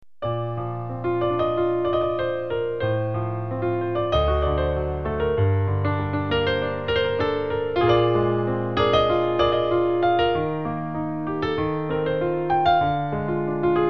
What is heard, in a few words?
improvisation